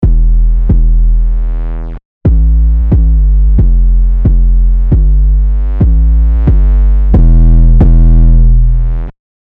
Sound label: 808 Bass; MK Ultra; revealed serum 2 808 vol 1